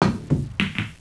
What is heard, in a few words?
pool brk, sports; Wired for Sound Pro CD; Aristosoft, Inc.